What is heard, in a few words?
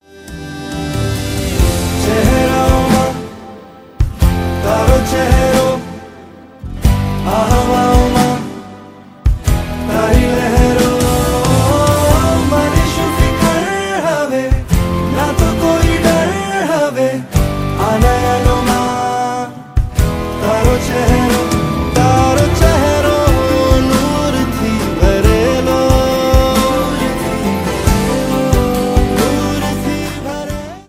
gujarati ringtone